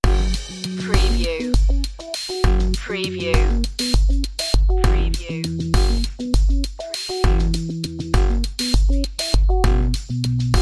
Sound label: electro hop loop